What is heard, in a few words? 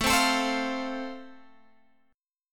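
A Minor Sharp 5th, Guitar Open G, chord strum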